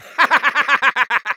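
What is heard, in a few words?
laugh happy, German; Fröhliches Gelächter; Soldier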